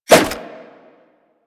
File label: Nunchaku End Whoosh; Warframe; Tenno